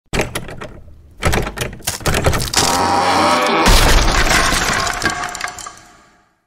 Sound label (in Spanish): Tratando de Abrir Puerta y Destrozandola Efecto de Sonido